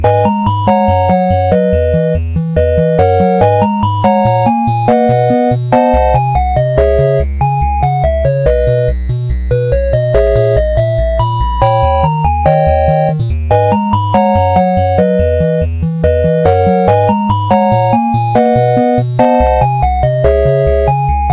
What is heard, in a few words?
8bit